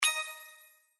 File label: bee Silhouette; slots; Cash Honey